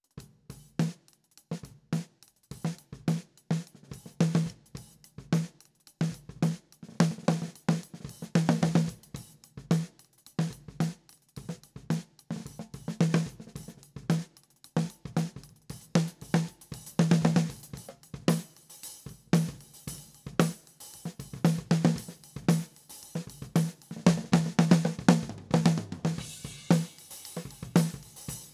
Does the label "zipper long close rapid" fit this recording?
No